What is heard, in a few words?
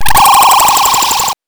randomize, 8 bits; Game Sound Solutions - 8 bits Elements